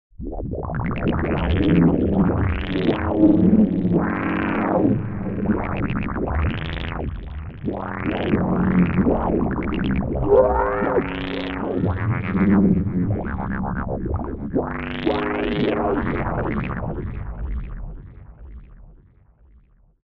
Fx Center of Earth; XILS

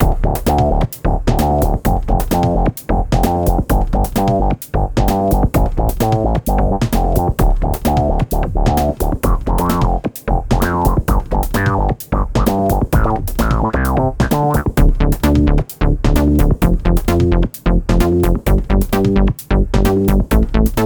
Essential Basses Drum; PolyKB-III; StiX

